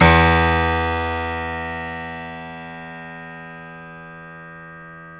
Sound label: PNO, Grand Pno; Club-50 - Foundations Roland; YPno 1 M